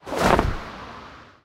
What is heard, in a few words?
Movement flying Jump start; Tom flying